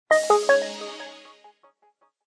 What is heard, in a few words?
Power Off, phonetones system; GS290 Cookie Fresh